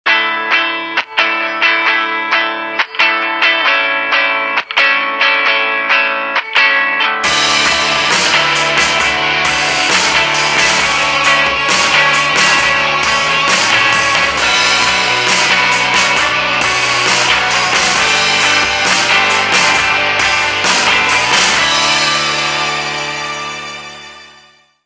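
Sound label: ringtone, Motorola A3100; run away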